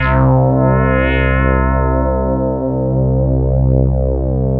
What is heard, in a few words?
SYN, SYN Analog; Sound & Vision - Gigapack I CD 2 (Roland)